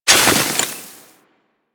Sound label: Melee Impact Snow; Warframe; Weapons